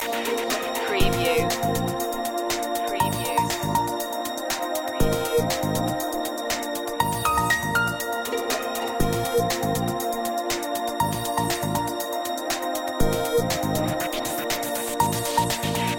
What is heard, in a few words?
radio waves loop